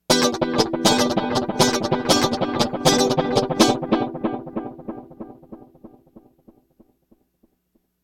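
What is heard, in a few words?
rhythm guitar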